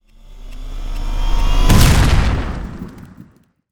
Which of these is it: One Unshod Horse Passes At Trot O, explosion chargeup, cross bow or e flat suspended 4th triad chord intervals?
explosion chargeup